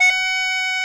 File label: POP F#, pipes; Grahm's pipes